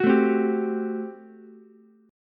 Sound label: G Major 7th Suspended 2nd Suspended 4th G down Ukulele; GM7sus2sus4 strummed